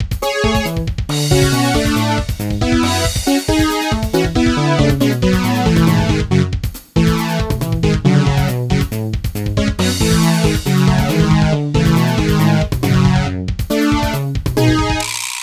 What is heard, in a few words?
Retro Music Synth Tempo Normal; WolfSynth